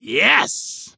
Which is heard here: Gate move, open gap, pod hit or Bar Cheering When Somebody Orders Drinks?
Bar Cheering When Somebody Orders Drinks